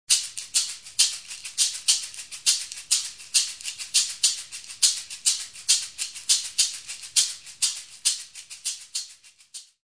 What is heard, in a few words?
caxixi